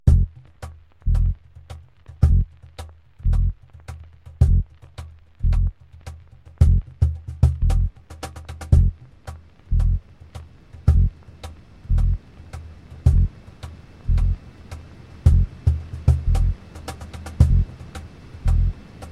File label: Transition